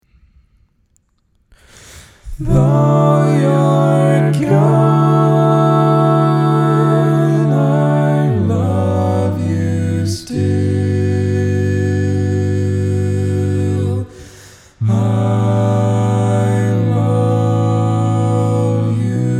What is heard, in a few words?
All Parts, barbershop